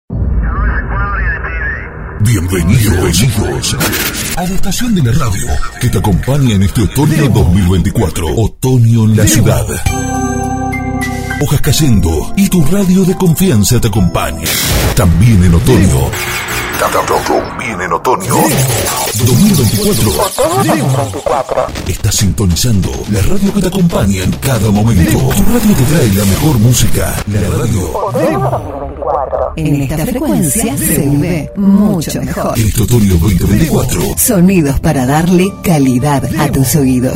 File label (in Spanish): OTONO SWING